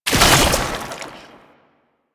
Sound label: Underground Attack Tentacle Burrow; Enemies; Abilities; Warframe; Proto Infested; Babau